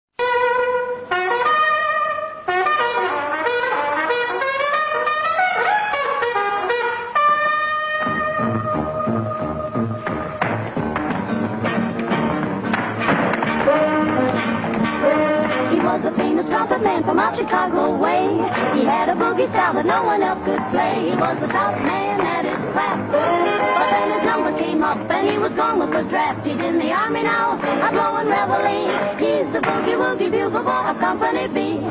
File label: bugle